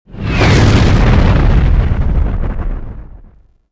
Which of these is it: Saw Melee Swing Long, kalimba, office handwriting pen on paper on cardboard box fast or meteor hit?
meteor hit